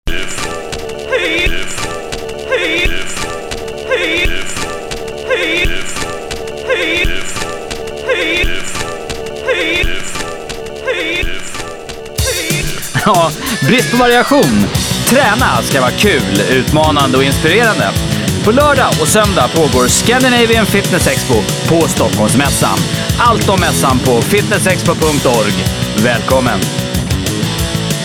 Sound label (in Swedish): jingel; variation